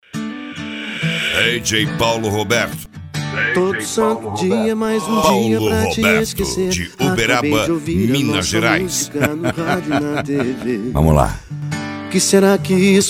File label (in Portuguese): sertanejo universitario